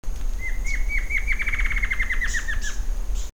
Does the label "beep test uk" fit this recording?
No